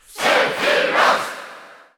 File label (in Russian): Cheer Russian; Sephiroth; SSBU